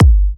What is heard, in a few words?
G, kicks; markus hakala kicks